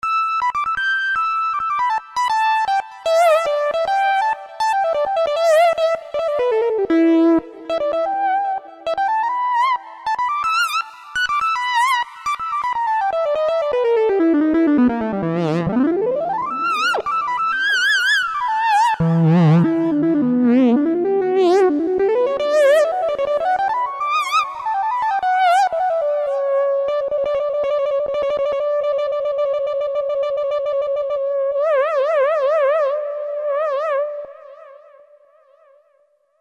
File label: Mini MG Lead, Roland JD-990; Vintage Board